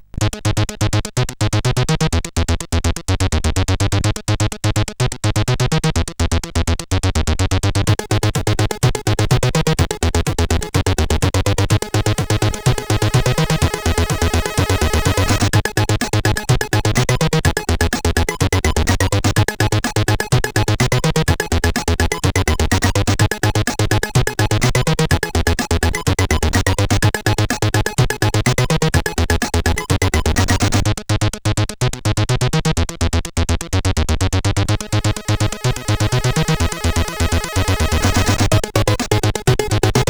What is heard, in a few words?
Droid, CSG8580R5